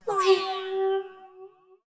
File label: Fall; Kneon